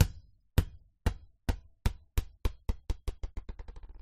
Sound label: soccer ball